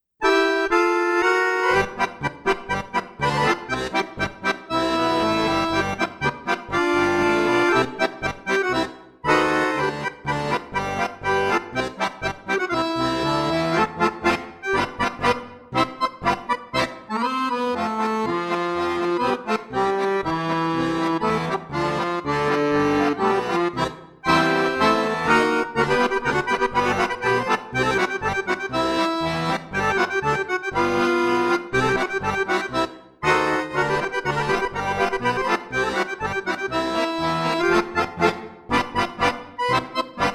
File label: Accordion, American Polka